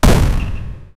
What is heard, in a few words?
video game cannonball exp